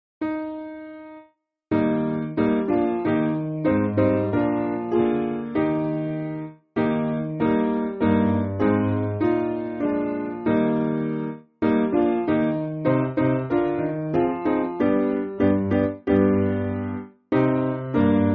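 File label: Piano, Music Backing Files for Churches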